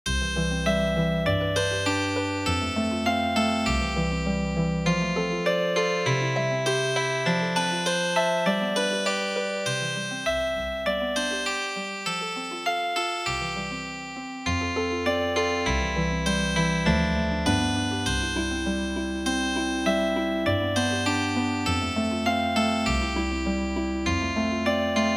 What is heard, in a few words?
GAVOTTE Healing Harp